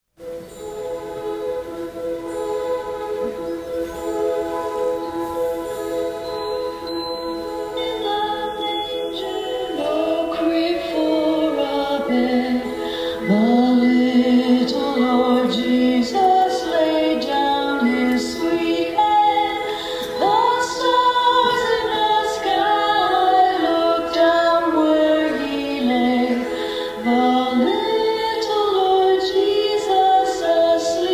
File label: Away In A Manger Vocal